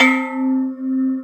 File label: AGOGO; CMP - Analogon; RESO